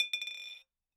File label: Bottle Struck